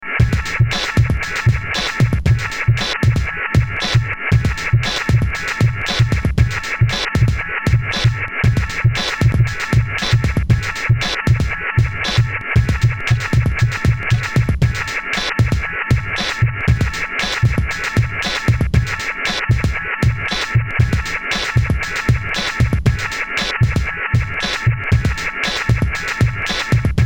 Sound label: hard beat with texture